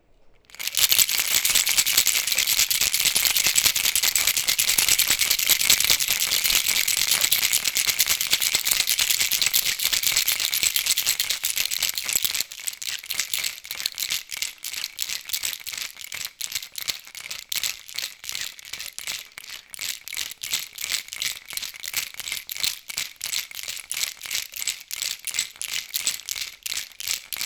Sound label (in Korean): 악기